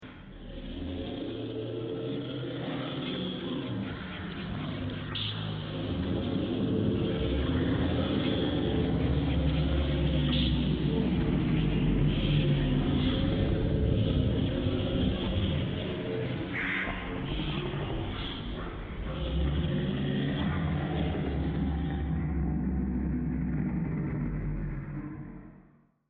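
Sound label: Science Fiction Cavern Ambience; star wars / trek style games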